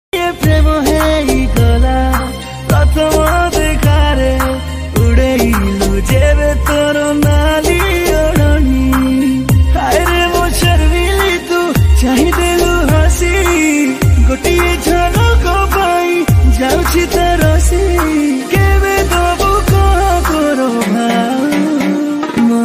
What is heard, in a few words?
odia song, odia romantic song